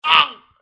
hurt

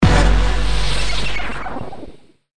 pong

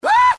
hurt

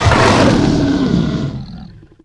stun; tera creatures; alpine predator

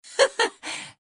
laugh short; Adachi

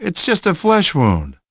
pain; ash

